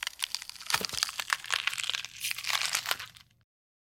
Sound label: body gore